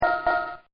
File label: BEEP; Beeps; Objects; Partners In Rhyme